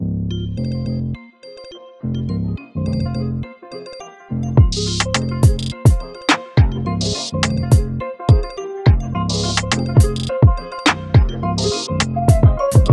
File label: JAZZ